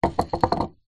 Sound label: industrial rust spray can set down surface